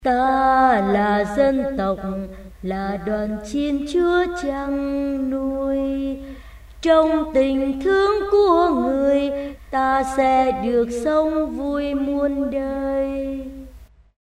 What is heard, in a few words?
tenor basso